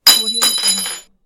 fallen iron

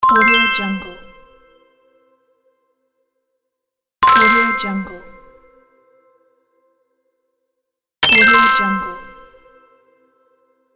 open magic chest

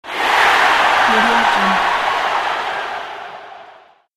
football game

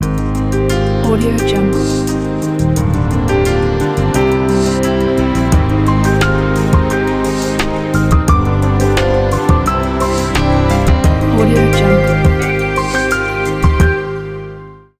no surprise ident

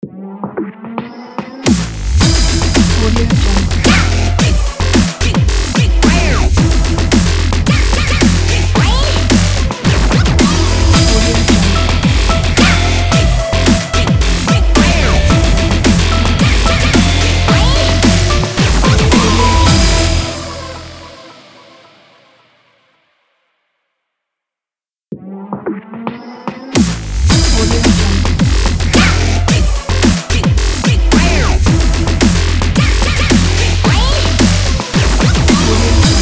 brutal dubstep logo

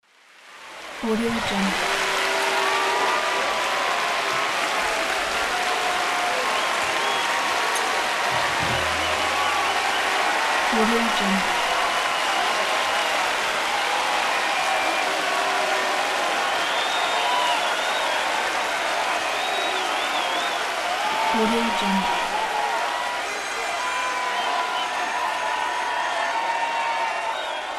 cheering applause

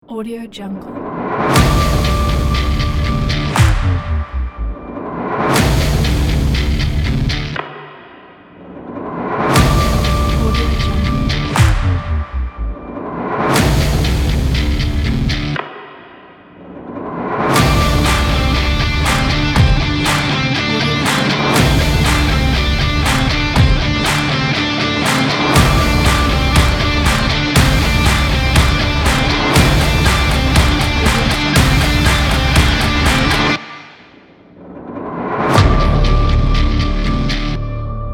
epic swagger cinematic trailer

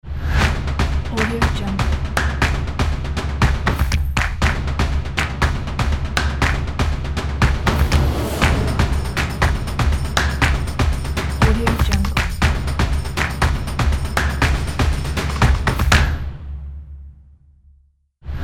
energetic drums uplifting logo ident

